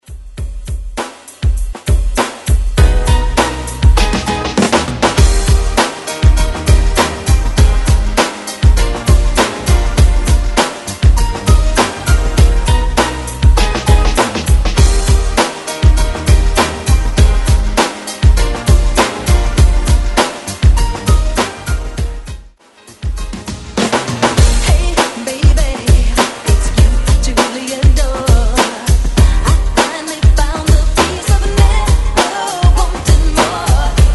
90s redrums

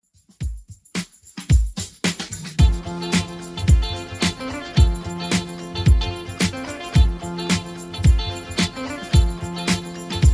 60s Redrum 110bpm

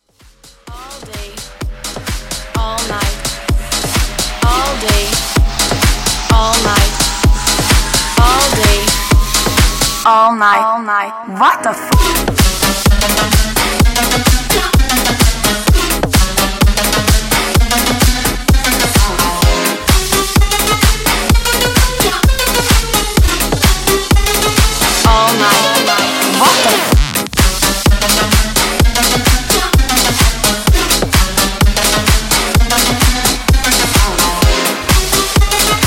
Epic Hybrid Mashup 128Bpm

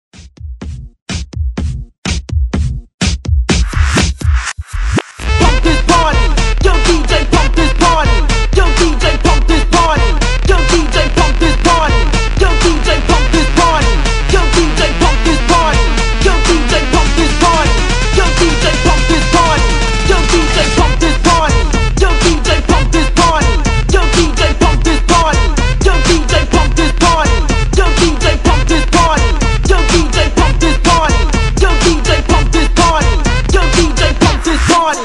Party Breaks 125 Bpm

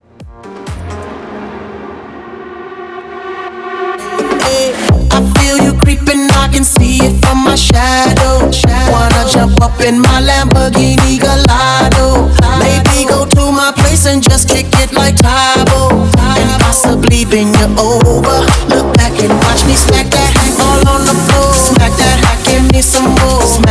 Mashup 128 BPM

